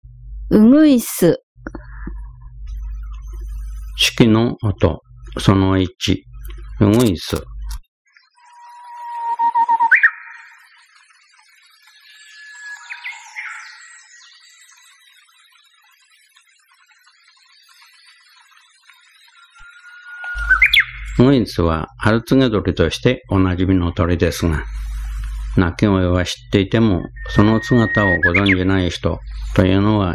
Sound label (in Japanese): uguisu, birds; sikioto